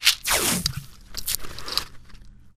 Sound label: Health Pack; zps